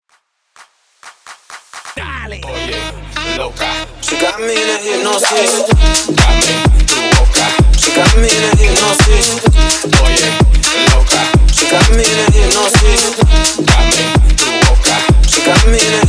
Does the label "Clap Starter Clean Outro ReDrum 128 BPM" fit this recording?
Yes